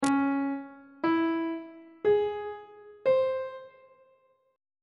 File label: d flat melodic minor 7th chord 1 is d flat minor major 7th; on treble clef